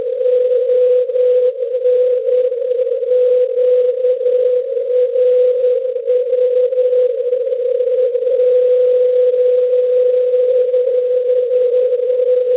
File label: cw